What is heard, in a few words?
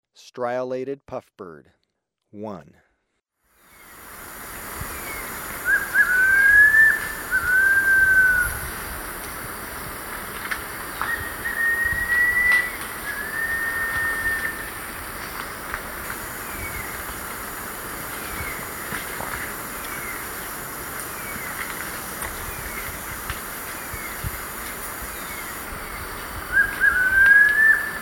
Stiolated Puffbird, Birds of Ecuador; S. America